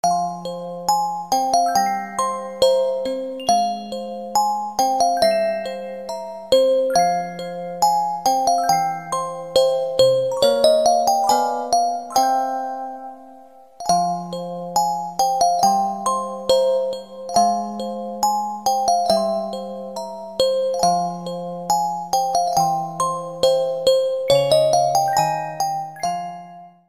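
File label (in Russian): requiem muzikalnaya shkatulka, music box; nausicaa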